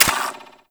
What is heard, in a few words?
Fire End, Weapons; Warframe; Braton Prime